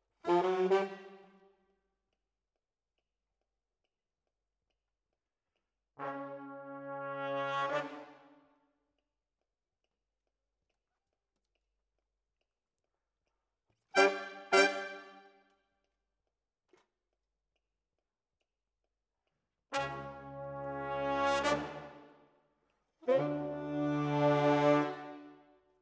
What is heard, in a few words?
Sax